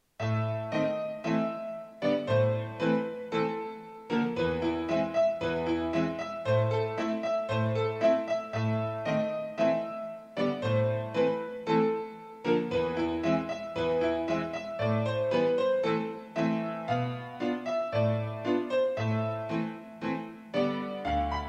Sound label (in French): tango; canaille